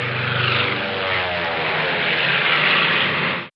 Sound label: glider